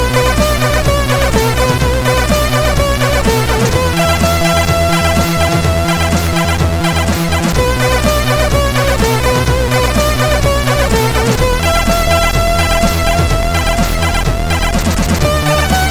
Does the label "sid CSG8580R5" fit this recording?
Yes